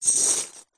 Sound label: foley keys large bunch prison guard set down on carpet